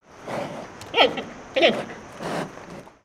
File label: Pets Bear Panda Beg; creatures; Lego